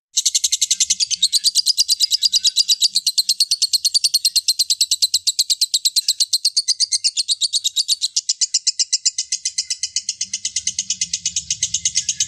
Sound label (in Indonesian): suara burung lovebird kusuma gacor ngekek panjang